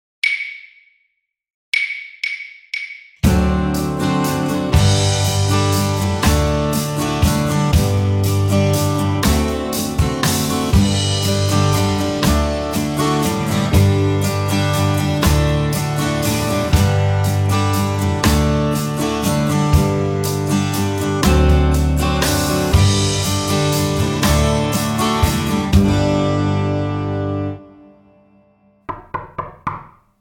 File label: 120 bpm